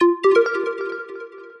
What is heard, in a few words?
login